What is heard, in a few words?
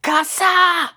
Battle Shout; Quirrel